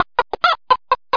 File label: chicken